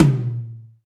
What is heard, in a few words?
DM5 Tom Hi, Alesis DM5 drum machine